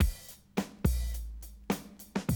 Classic DrumLoops

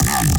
Dubstep Bass F1

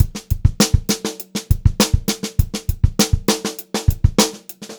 BEAT, Funk-Groove Drumloops

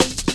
Rollin Shaker Cut, Drum Loops 166.5; Zero-G Jungle Warfare 1, 2 & 3

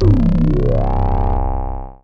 Synth (low oww; Sonic Foundry (Sony Creative Software); Ugly Remnants VOL-2

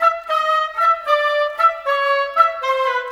Clarinet, Flute Oboe; Cinematic; Orchestral; Sonic Foundry (Sony Creative Software); Orchestral Series VOL-3 - Cinematic

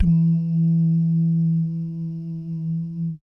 BS DUMM, Vocal Planet Jazz & FX; ILIO; BASS DUMMS

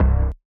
Blap Bass; Big Fish Audio; Old Skool House; OSH Bass

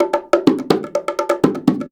Percussion Loops, Bongo & Conga; Houseworx

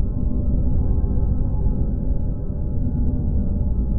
Sample n Hold Verb Loops, sparse soundscape

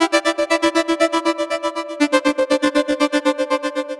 Progressive Synth, Trance

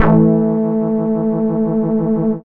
SWIRL; X-Static Goldmine 1

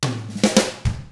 105 POP RK, Drums; ILIO; Double Platinum Drums 1

